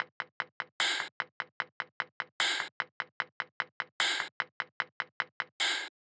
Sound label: Percussion Loop, 150 BPM; Cymatics; Light Free Sample Pack; Light Samples